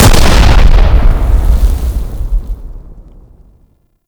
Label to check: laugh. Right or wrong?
Wrong